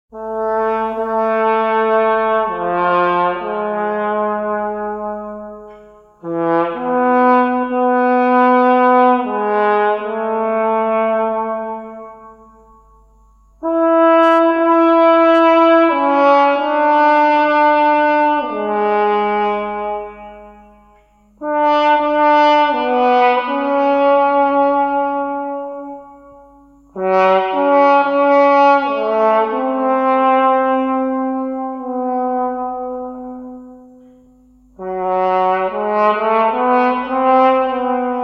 Horn

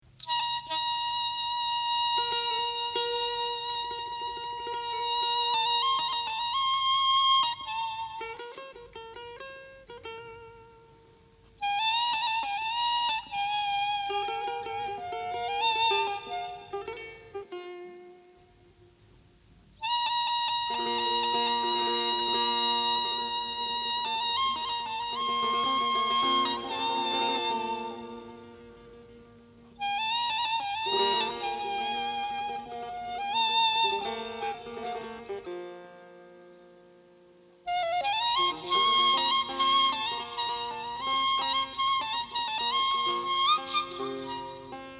Taknavaziye Setar

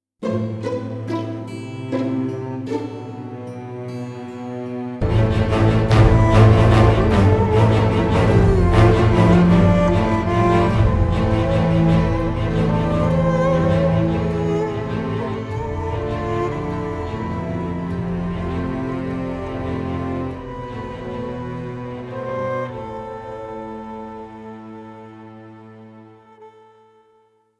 Instrumental

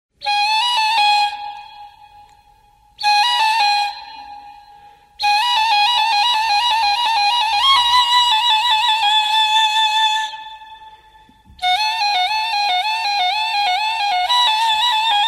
Tasnif Ney

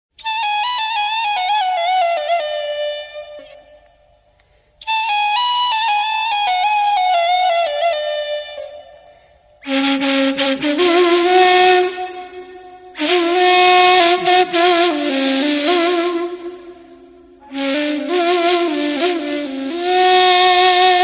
Tasnif Ney

